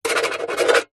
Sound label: office pen biro writing sign signature metal box